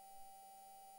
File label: mic, mars2020 edlcam